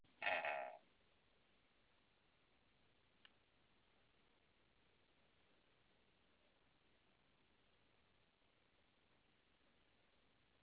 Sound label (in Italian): registrazione verso